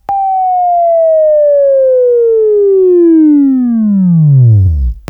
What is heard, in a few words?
WAV Left, Sweeping; Sweeping Training program